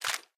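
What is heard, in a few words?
inventory items ammo; STALKER; IWP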